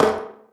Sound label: pipe land; Golden Crayon Sounds